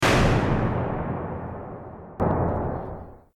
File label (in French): fermeture porte; victor pellet; tempete